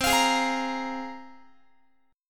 C 7th Suspended 4th Sharp 5th, Guitar; C7sus4#5 strummed

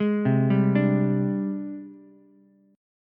Ab Major Sharp 11th Ab down Ukulele C6; AbM#11 arpeggiated; JGuitar

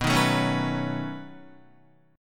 B 9th B down Guitar; B9 strummed; JGuitar

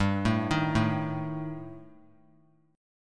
G Suspended 2nd Sharp 5th; Gsus2#5 arpeggiated; JGuitar

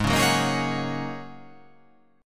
G sharp 9th Suspended 4th G sharp

